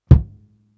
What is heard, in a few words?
Kicks; Touch Loops; CosmicFunk